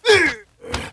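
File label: fire lich damage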